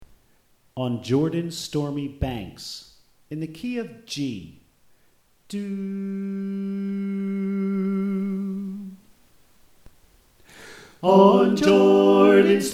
Soprano Left

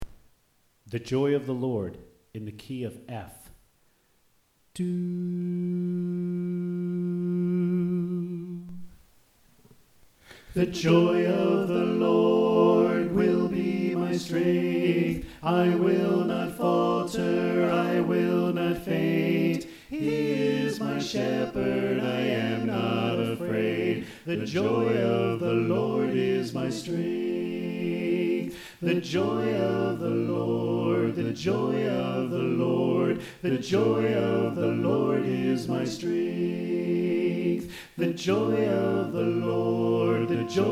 Tenor Left

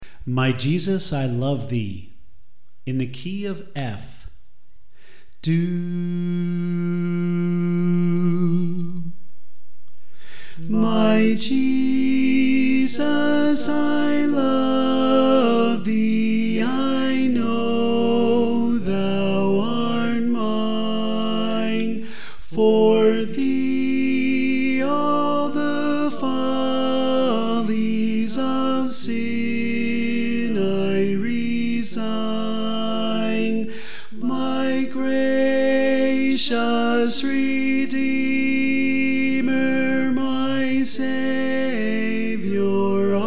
Tenor

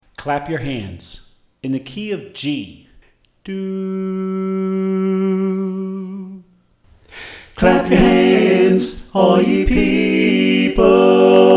Equal Mix

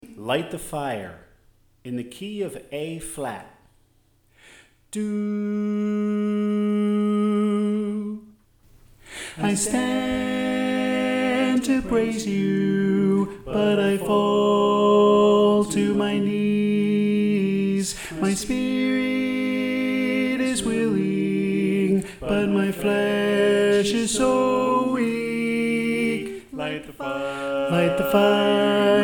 Tenor

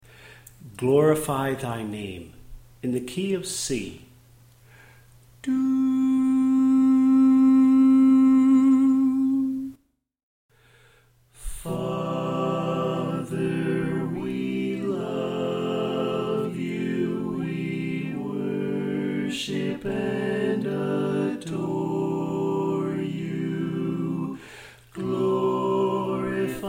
Bass Left

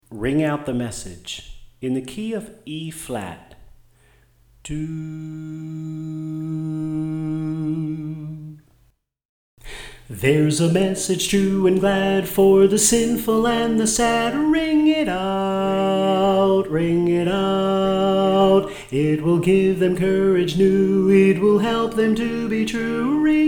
Soprano

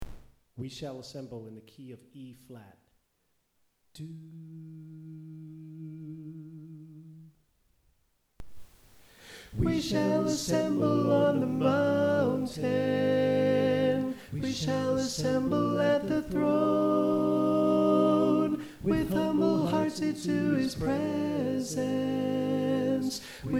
Alto

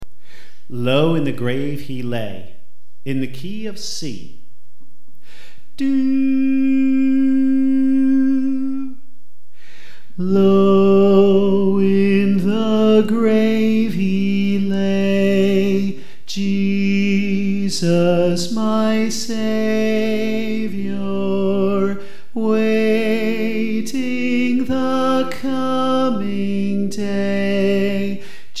Soprano

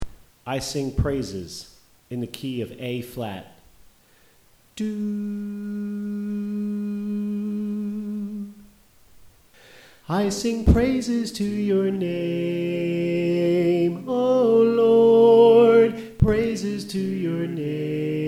Soprano

